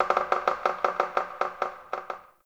Tree Pine Branch Creak; Ambience; Trees

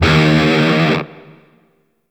Shawzin Flourish Oct One D Sharp; DE; Warframe; Grineer Shawzin

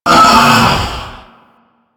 Falling Tile; Warframe; Clan Dojo; Obstacle Course

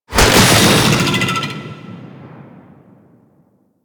Boost Thruster; Warframe; Vehicles; Archwing Demolition

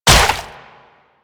Step Water; Warframe; Infested; Zealoid Prelate

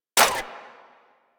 Pistol Mech, weapons; Warframe; Thanotech

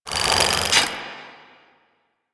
Speargun Ready, Weapons; Warframe; Tenno; Priest Spear

